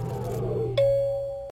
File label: elevator